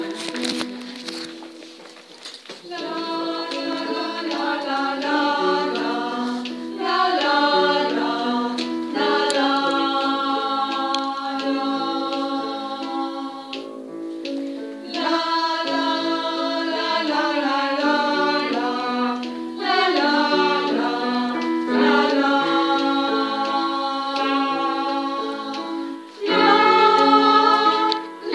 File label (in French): pastorelle la la la alto